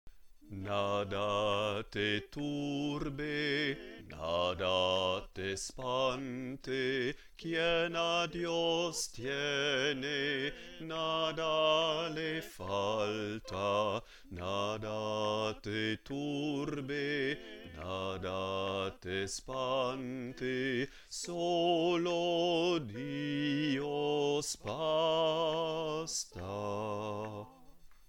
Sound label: bas